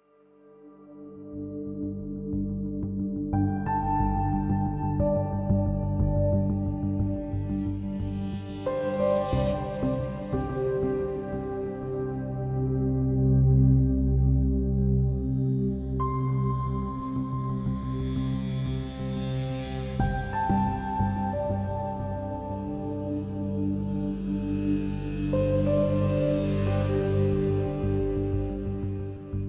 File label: Ambient Wonderful; NWNDS; Ambient music pack